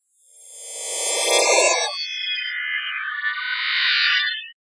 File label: spooky wash; Various Strange Sounds and Effects